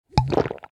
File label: item potion drink loop